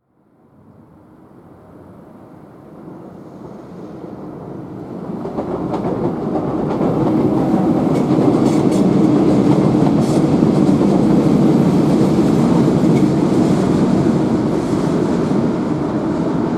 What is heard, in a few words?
deutsche bahn sound